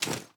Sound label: reload empty waffle rotate; reloads; gmod; iw8